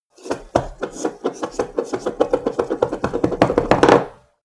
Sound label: cup spin